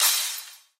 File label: combo break; [DT] Rafis HDDT f5